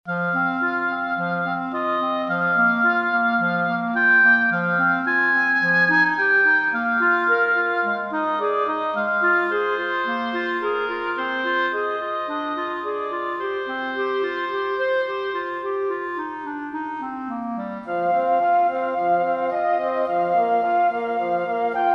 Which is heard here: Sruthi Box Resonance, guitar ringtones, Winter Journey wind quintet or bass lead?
Winter Journey wind quintet